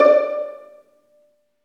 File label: String Vlns Pizz